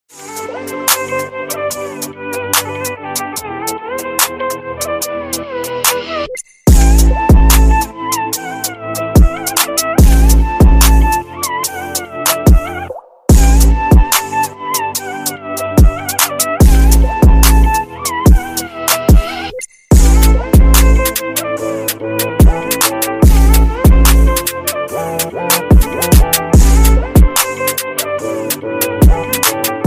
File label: indian instrumental drill beat ringtone love drill type beat